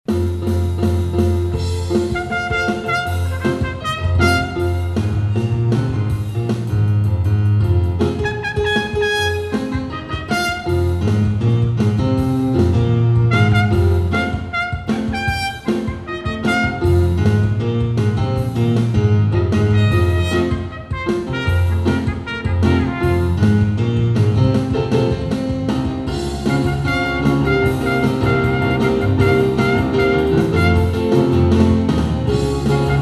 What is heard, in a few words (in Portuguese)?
Piano e Cordas